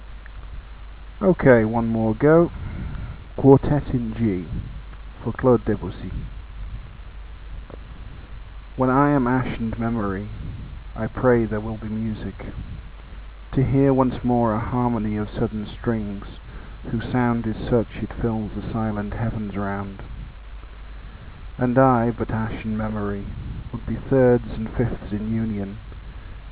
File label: Quartet